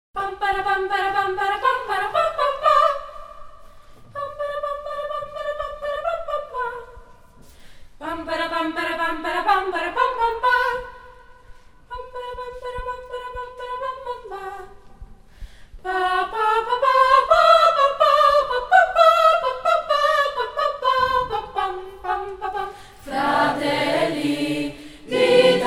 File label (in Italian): INNO CORO